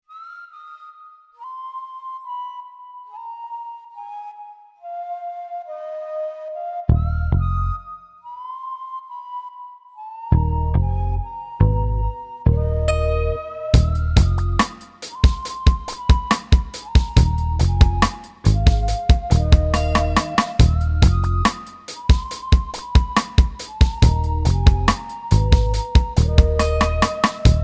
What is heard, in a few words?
MIDI ditty